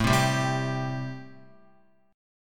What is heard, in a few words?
A-Minor 7th A Guitar; Am7 strummed; JGuitar